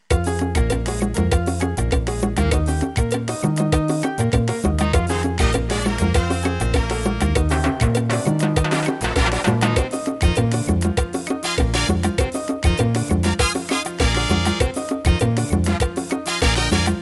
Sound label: Mambo